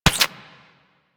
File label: Inf Punch Life Steal F; Warframe; Weapons; Infested; Inf Kick Punch